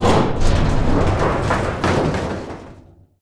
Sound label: explosive objects; World of Tanks